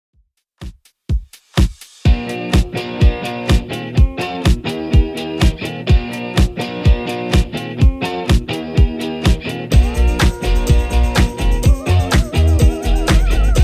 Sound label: 90s DJ Friendly Redrum 125bpm